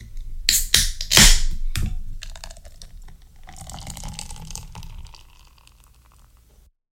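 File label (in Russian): большие призраки открывают холодный, звуки призраков; Таинственные шепота, стоны и шаги призраков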